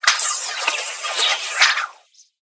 Wire Whip; Ambience; Warframe; Corpus Gas City

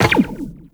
Tenno Run Zipline, Footsteps; Warframe

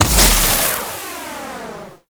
Immolation; Warframe; Ember

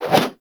Melee Swing; Warframe; Caliber Chicks Minigame

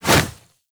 Fist Swipe; Warframe; Pacifist

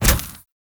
Ghoul Drill Flame Burst

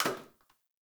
Janitor Plastic Spray Bottle; Warframe; Ambience; NineteenNinetyNine

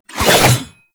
Bayonet Melee Charge Up Spin; Warframe; Tenno

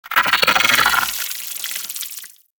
Arachnoid Wraith Vocal Patrol; Warframe; Corpus

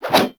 Melee Swing; Warframe; Caliber Chicks Minigame

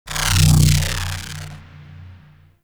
Hub Concourse Holigram Switch; Warframe; Ambience; Tenno Hub Concourse Fragment B

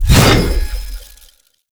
Sword Swipe; Warframe; Ballas Sword; Weapons Melee; Whooshes

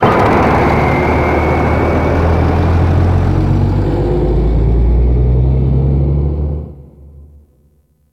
Ice Capture ATV Away; Warframe; Corpus

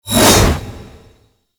Hammer Glass Whoosh

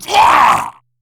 Pain, Battle Barks; en; Grineer Ghoul Sawman

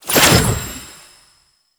Rune Pickup; Warframe; Entrati; Mirror Defense

